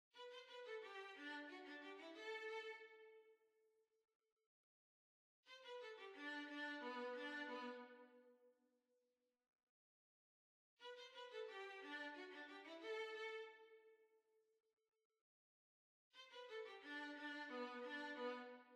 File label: Violin